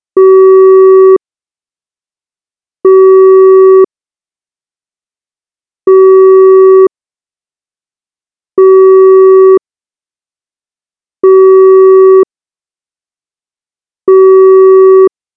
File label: Telephone Calling Signal